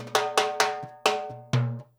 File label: FILL, Percussion, DANZON; InVision Interactive; Percussion Slam